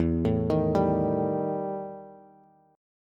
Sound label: E Minor Major 7th Double Flat 5th E Bass; arpeggiated; JGuitar